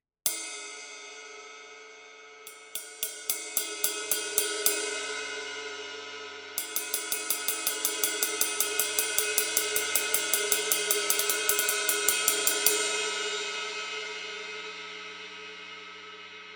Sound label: Power Ride 22 Pattern; Signature; New Instruments 2021